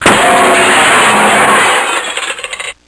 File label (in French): Fracas; debris